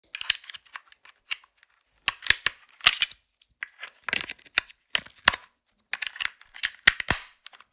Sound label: Remote TV controller changing battery; appliances